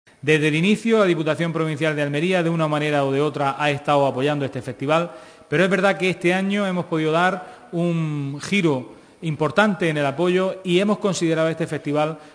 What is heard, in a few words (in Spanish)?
flamenco